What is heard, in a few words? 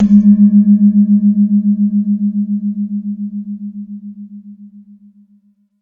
Jegog